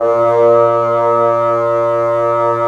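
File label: WND BN, wind bassoon; LA Composer vol. 3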